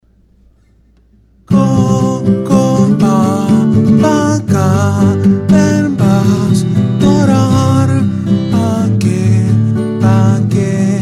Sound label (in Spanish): Gtr y voz